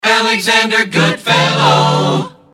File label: jingles resings jock cut accapella; pams